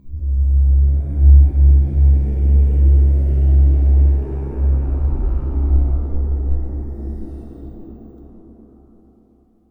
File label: slow throat sub rumbling whoosh